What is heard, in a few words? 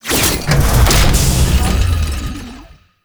Forge Exit; Warframe; Railjack; Player Ship